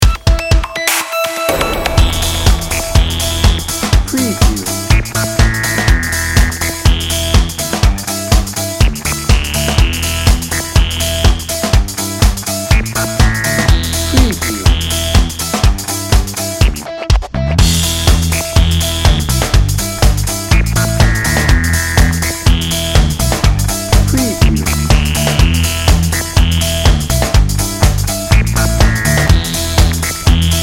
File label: dance magic bed, Upbeat; ecstatic